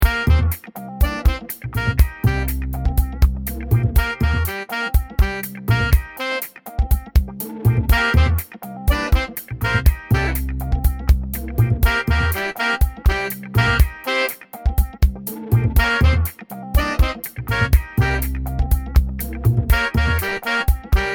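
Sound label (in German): Funky Solo Dorisch Phrygisch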